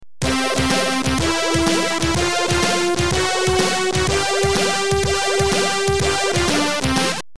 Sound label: game over; zoom; amiga